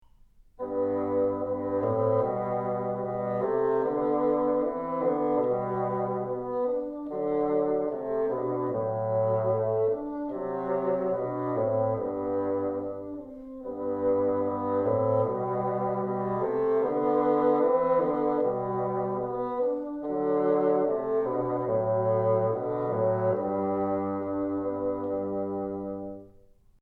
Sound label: together, bassoon